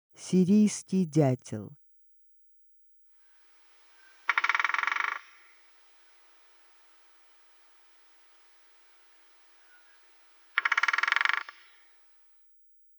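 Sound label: siriyskiy dyatel voice, Syrian woodpecker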